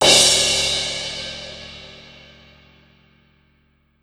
Crash Long; pitchglitch samples; Trivialaccapella

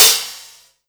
Crash Splash; pitchglitch

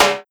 Tom Mid

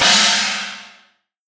Cymbal China Short; pitchglitch

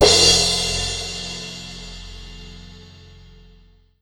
Crash Long; pitchglitch samples; Forzee

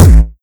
Kick Dist Kick Hard Short; Psycho Boomer

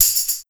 Tambourine Tripple

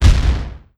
Sound FX Scifi Drum Kick

